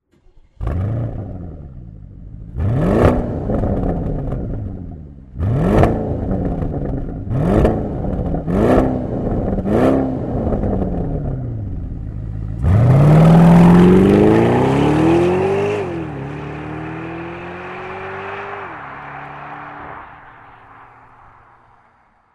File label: Magnum Accelerate Outside